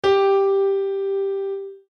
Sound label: instruments piano so; maoudamashii